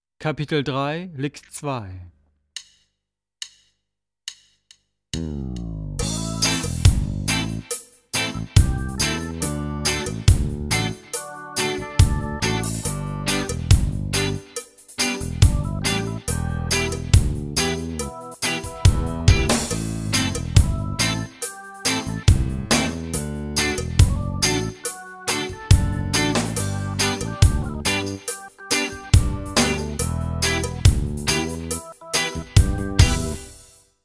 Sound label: Latin Style Guitar